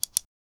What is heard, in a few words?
cursor hovering over butt